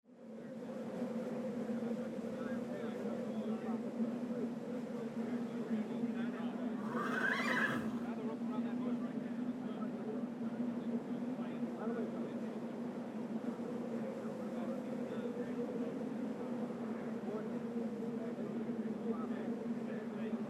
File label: Ambience; Age of Empires III; cinematics